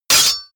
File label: sword parry metal